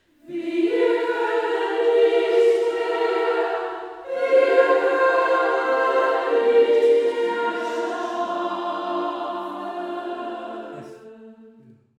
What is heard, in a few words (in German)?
Auftakt Takt 35 ff S 3 im Tutti